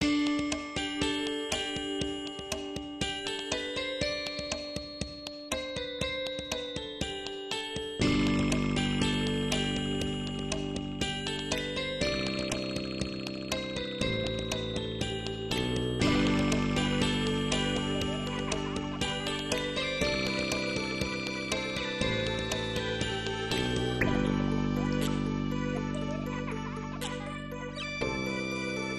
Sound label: Pleasant Happy Tune